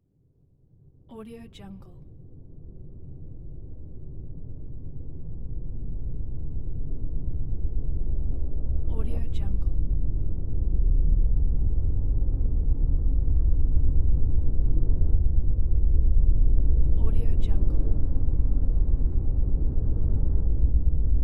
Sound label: drone ambience